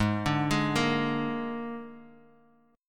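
Ab Suspended 2nd Flat 5th chord, Guitar, Open D tuning; 6,5,6,4,x,x; down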